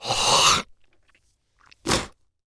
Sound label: Human Male Spitting